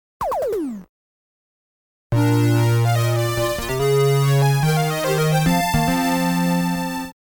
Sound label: credit ~ race start fanfare